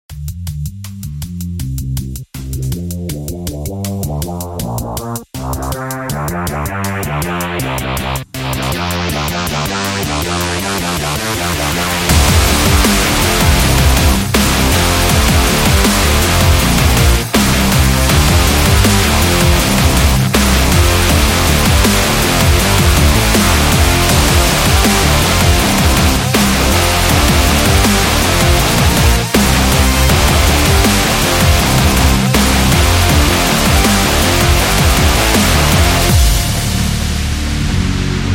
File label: Dubstep Rocks